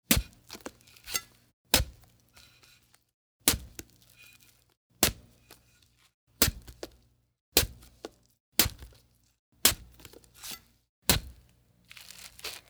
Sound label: stabbing watermelon; theshaggyfreak